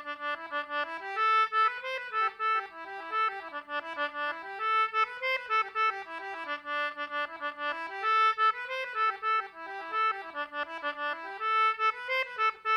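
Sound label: Celtic Button Box, jigs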